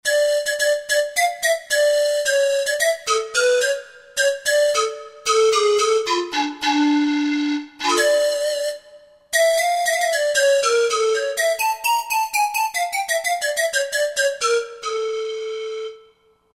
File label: Pan Flute, Roland JV-2080